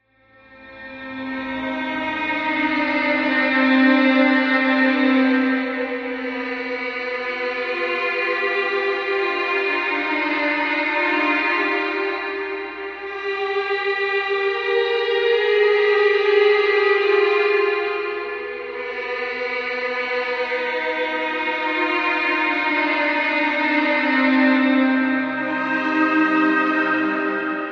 Music Eerie String, orchestral

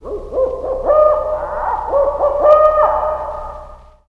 Macaw Night Howling

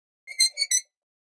Metal Scrape On Metal

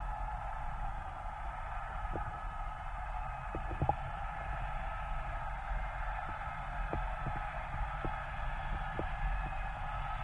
Torpedo Jet By, torpedos

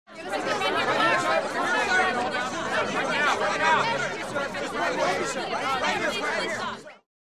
Reporter Walla Yelle, questioning walla

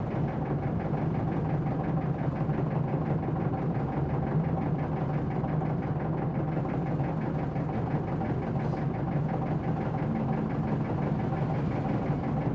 English Taxi Idles

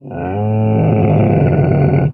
Camel Groan Growl Sin; camels

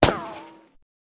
Bullet Ricco Single

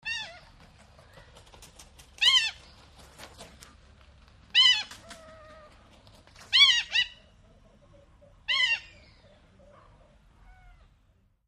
Fish Eagle Juvenile, eagles african

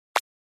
Button Plastic Warp E

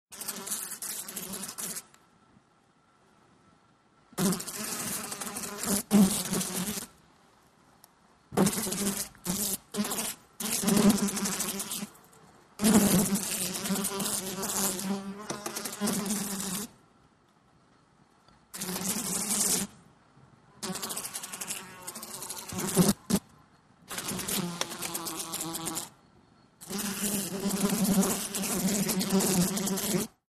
Flies Buzz On Window

